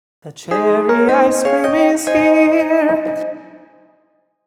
song

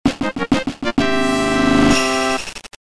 Star Catch Fanfare; Super Mario 64 Megamix

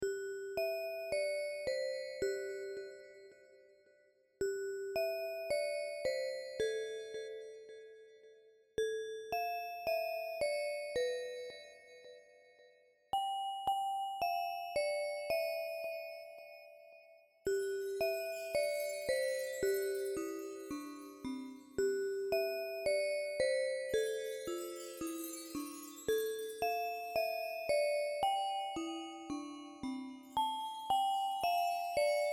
jingle bells

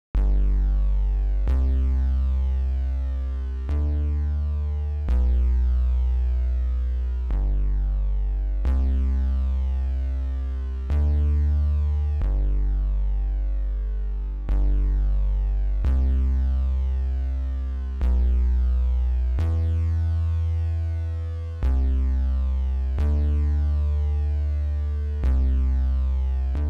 Bass

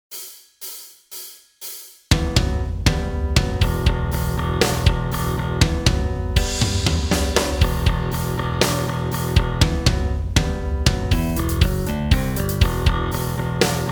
Backing Track; Riff Lord Couterpoint Etude

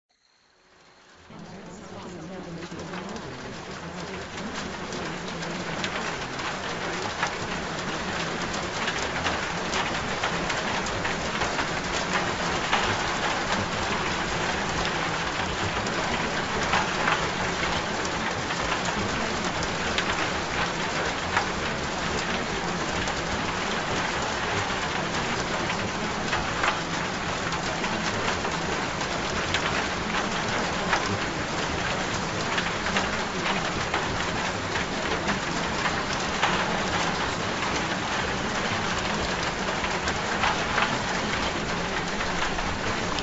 Rain Sounds

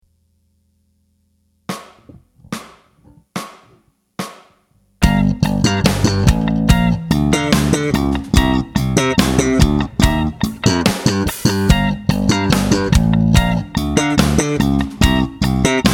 Practice Track

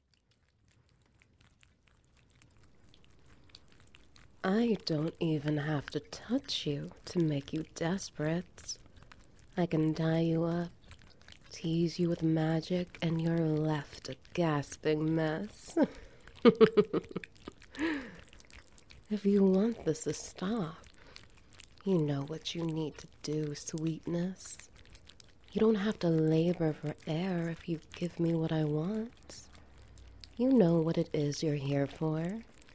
Ambience Wet Noises; The Vampire's Pet

